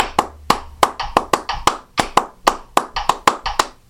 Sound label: clapped; 12 claves